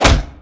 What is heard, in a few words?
Damage Stick; Knight Online